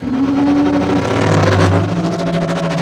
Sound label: speeder accelerate; sithspeeder